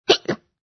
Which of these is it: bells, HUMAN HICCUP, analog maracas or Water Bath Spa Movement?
HUMAN HICCUP